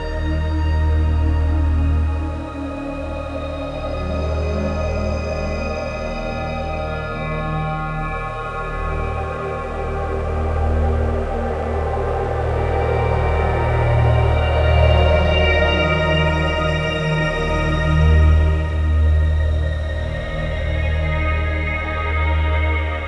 Synvoxperc Scape; Sonic Foundry (Sony Creative Software); Ma Ja Le; Soundworlds

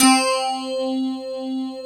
FM ELP 7 C3 R, Keyboards Old School; USB Soundscan vol.09; AKAI

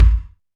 DM5 Kick, Alesis DM5 drum machine

